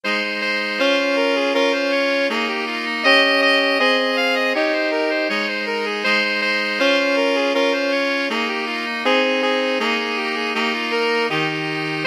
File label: SAX, 2 altos tenor sax